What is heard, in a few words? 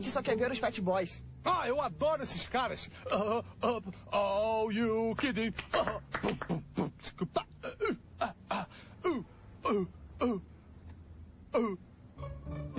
beatbox